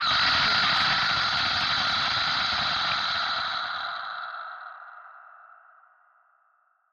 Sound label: distorted screech; SoundsCrate